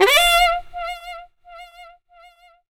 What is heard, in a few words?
SAX RIF; E-Lab; X Static Goldmine 2; akai